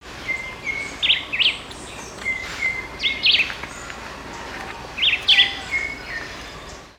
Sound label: Black-whiskered Vireo